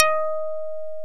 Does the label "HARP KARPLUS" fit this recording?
Yes